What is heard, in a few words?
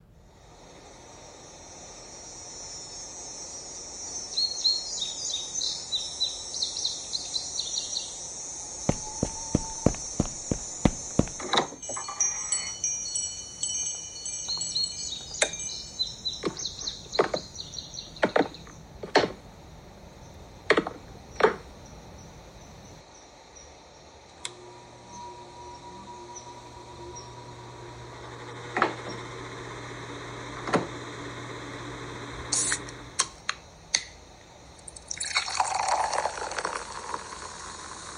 event sounds